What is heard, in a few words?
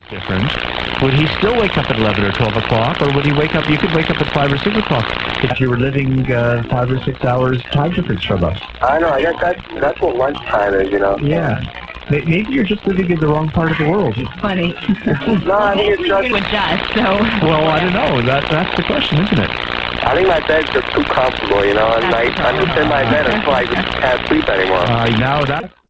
noise